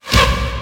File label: Short Fills & Reverse; Vengeance Samples